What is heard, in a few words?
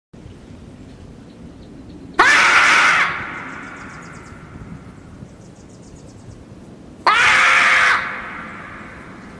marmot